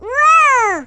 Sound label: wooaah; sfx; Universo Animado